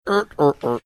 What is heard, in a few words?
making three funny noises